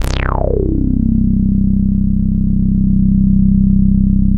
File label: Dance Floor Bass, Multisample Basses